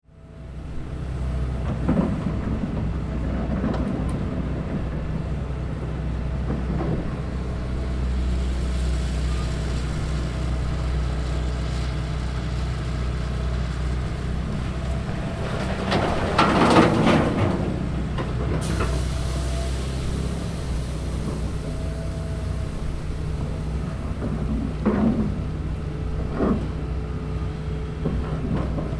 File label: Construction Excavator Working Long; Excavator, construction vehicle, working. Digging and scraping in the ground, dumping rocks into container; Construction Sound Effects